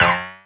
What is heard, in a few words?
perc; Anthemic.Electro; drum hits